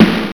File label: smooth bottom end snare drum g key, snare single hit tuned to the G note